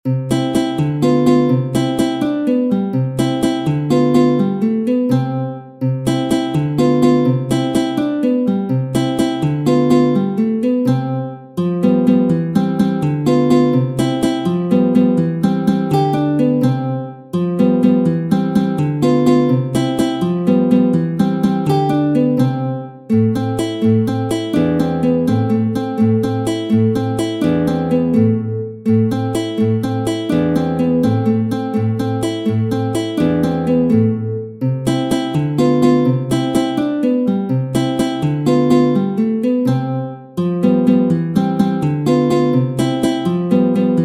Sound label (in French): Walze, Méthode pour la guitare; Ferdinando Carulli; Op. 241; Classical guitar